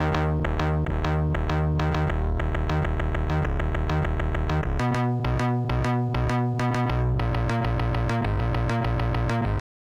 Bass No Multi

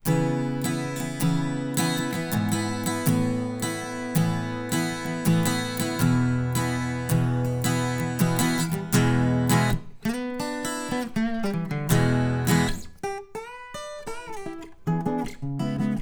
Ac Gtr Gtr Ear R

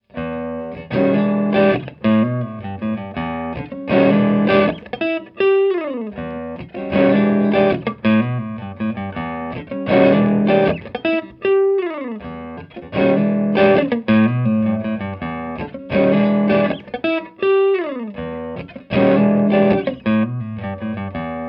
Distance Elec Gtr Far

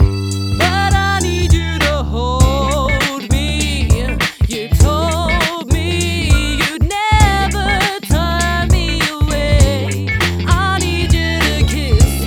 Rev Alts Vox Widener Mix

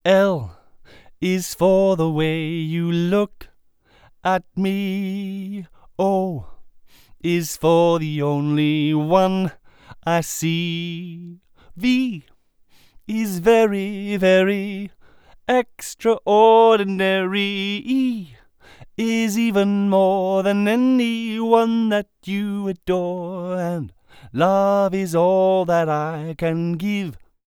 Pattern 0deg Card

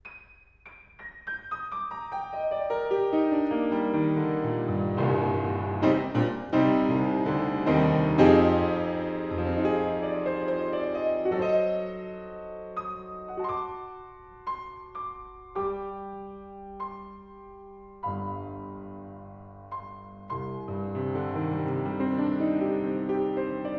Piano, Vert 280cm Mic

